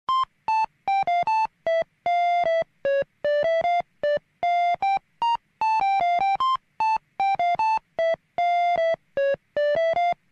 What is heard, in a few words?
Ringtone Alarm; Fooly Cooly Progressive